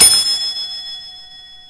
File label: ding; bfdi